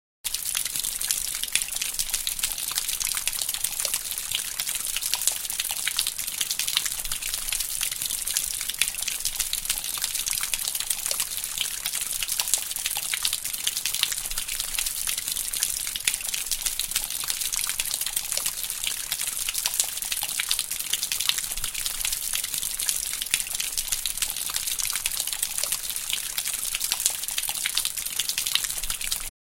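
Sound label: pleasant rain sounds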